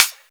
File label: dry hihat C# key; hits one shots; hat samples; C sharp